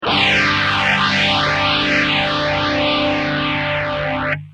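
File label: guitar Mutron biphase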